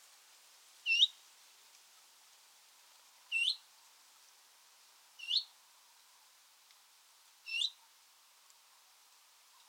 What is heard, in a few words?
Fitis - Phylloscopus trochilus call